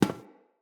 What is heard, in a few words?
Friendly NPC Step Default, Footsteps; Characters